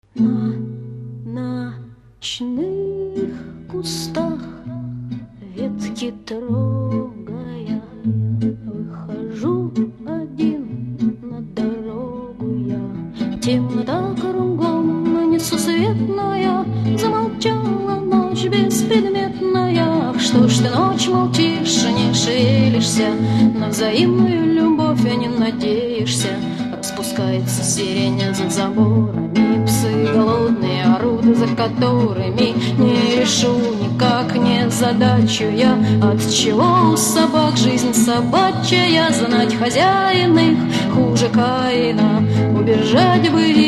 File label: Dramatic Romance